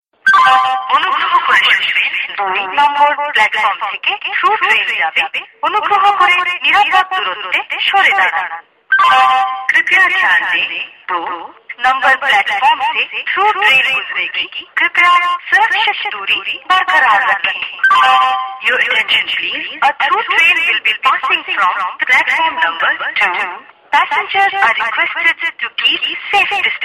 railway station, sms; ringtones

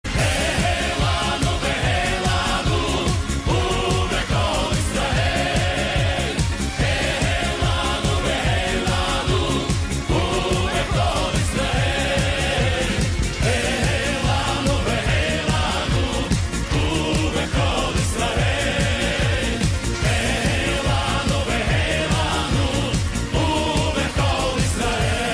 hebrew songs

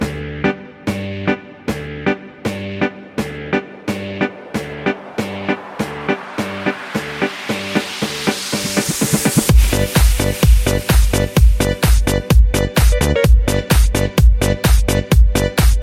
sirtaki

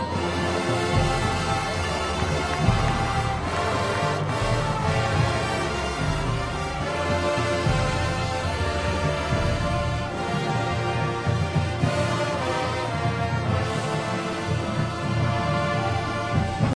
Jazzy Band

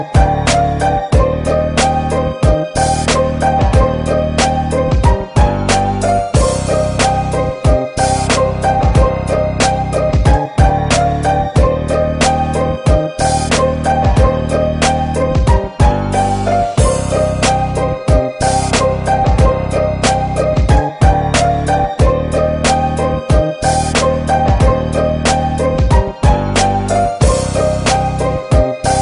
Rap Beat Beat

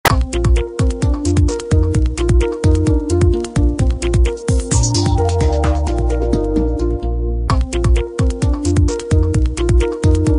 ring tone; tisalat

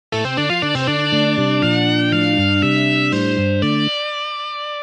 blues rock ringtone

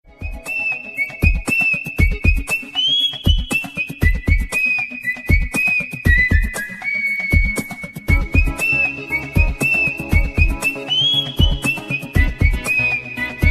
a whistle; games; ringtones

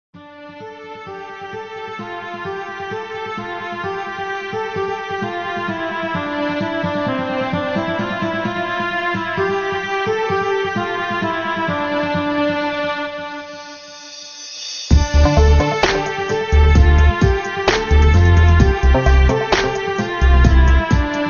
Most Romantic Tune

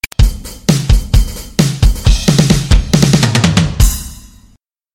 drum notification

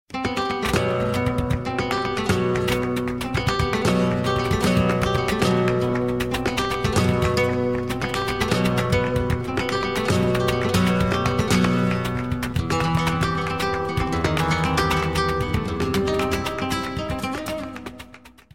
flamenco tone; latino

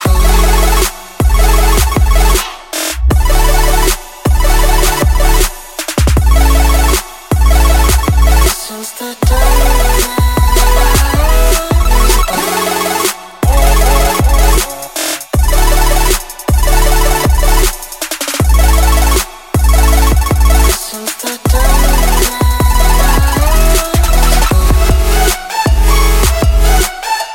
Dubstep

